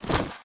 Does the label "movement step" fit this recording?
Yes